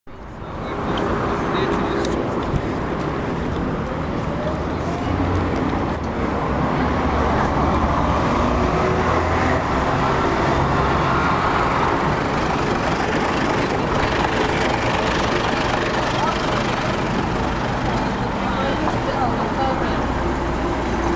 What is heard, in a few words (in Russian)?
zvuki goroda